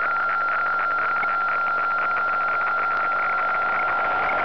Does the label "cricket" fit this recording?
Yes